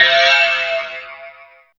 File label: ALIENATION, FILM PERC; Drums In Your FACE!